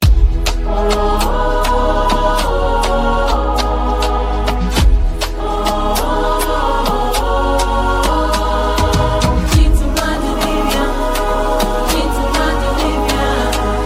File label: Loud Urban Choir